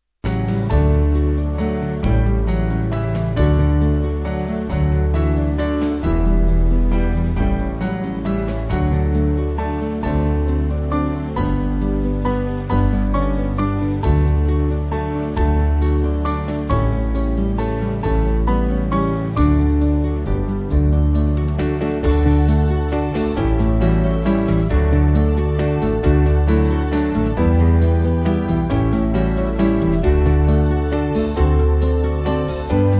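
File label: Band, Music Backing Files for Churches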